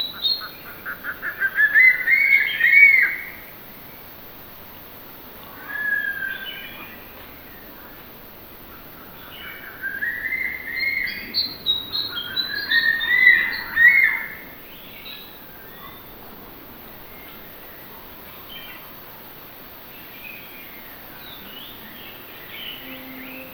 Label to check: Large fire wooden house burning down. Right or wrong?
Wrong